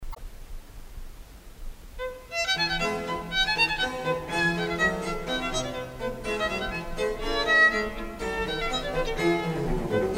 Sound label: Violin Sonata in F Major, Allegro; Nine German Arias; Op.1